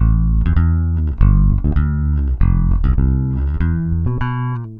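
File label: bass A minor, bass baion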